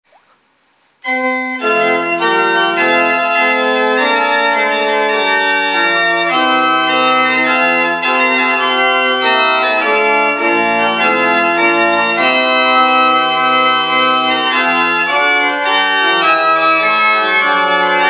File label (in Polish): Organy; Binarowa